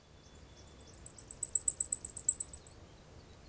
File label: blackpoll